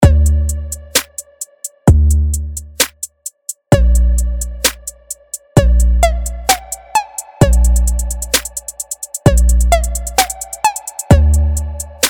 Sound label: Trap; Nexus 3; Trap 4; Luna Eclipse